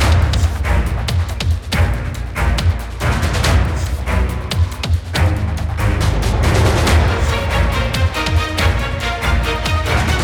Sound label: avg exciting loop, music